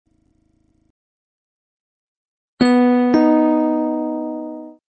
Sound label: jingle alt